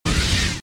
tooth rush; tak bites

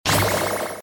pwrup appear; tak bites

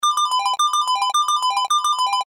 secret Bonus; tak bites

